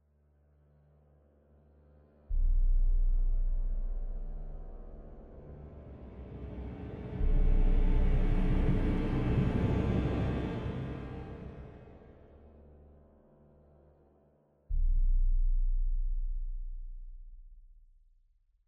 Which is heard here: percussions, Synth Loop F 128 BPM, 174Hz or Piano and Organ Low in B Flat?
percussions